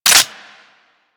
Dual Soma Clip In

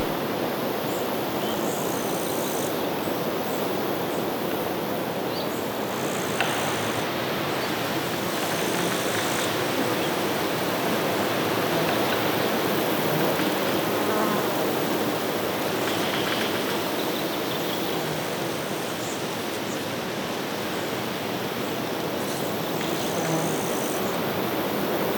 Ambience Forest Loop, Exterior

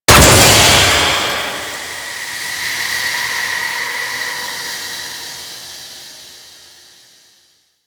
Defense Cryo Pod Damage; Warframe

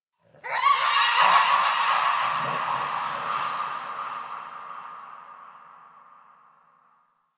Creature Distant Mid; Ambience; Misc Outdoor; Warframe; Infested Eris

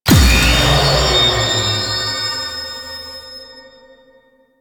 Enemy Spawn

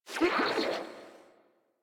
Frogman Pain; Vocals; Warframe; Grineer

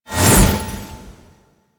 Sword Attack Whoosh; Weapons; Melee; Warframe; Orokin Weave Sword; Weave Boss

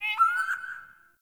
Forest Open Glade Bird Far, Day

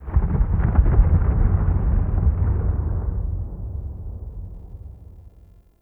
Landscape Glacier Ice Distant, Ambience; Warframe; Venus Open World; One Offs

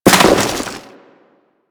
Crewman Land Dirt, Footsteps; Warframe; Corpus

